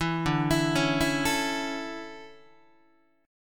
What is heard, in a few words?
D Major 7th Suspended 2nd, D, down, Guitar; arpeggiated